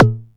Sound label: Tom Bucket; Drums